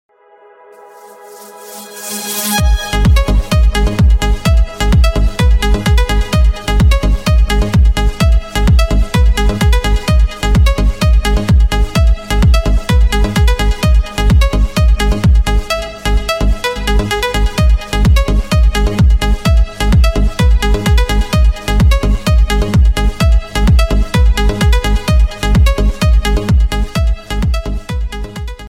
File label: dance trance ringtone